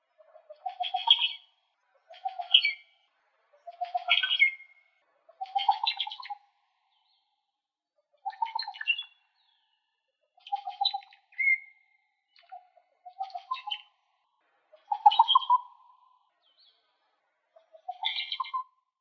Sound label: Dusky green Oropendola